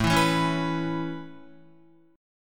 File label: A Major Add 9th A Guitar, chord; Aadd9 strummed; JGuitar